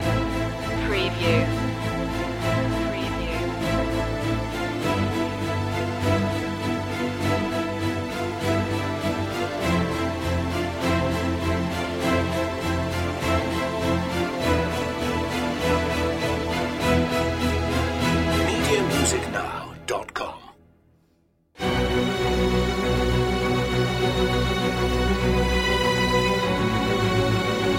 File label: strings only